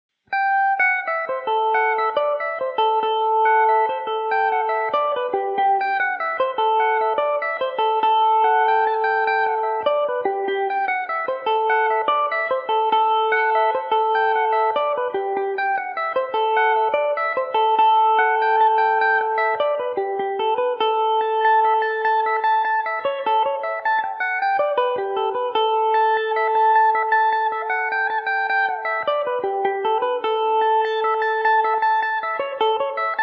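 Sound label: A dorian mandobird, Irish mandolin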